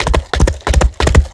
running; lighthouse pluton; lighthousemonster

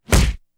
scream; Garry's Mod; apple green

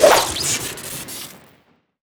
pickaxe swing crit; tf2

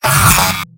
engineer pain sharp; tf2; mvm

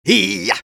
taunt exert; taunts; tf2; engy

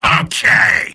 soldier positive vocalization; tf2; mvm

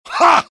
heavy taunt exert; vo; taunts; tf2

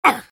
soldier pain sharp

